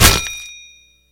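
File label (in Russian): попадание ножа в металлическую цель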